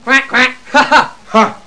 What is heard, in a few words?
mad laugh